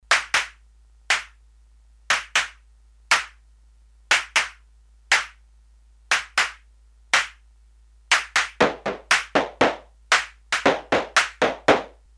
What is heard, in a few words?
Synth Claps, Korg DS-8; Factory Patches